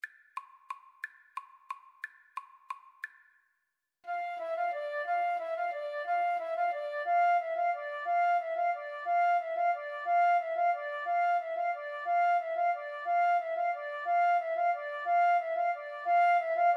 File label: carol of the bells, Flute-Clarinet Duet